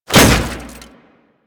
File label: Restrained Foley; Warframe; Solaris; Solaris Worker